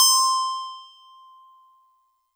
StarPiano DX100 C6

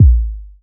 KICK

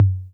Tom Low B 808 Tape, Clean

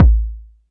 BD

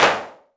Clap; EFM

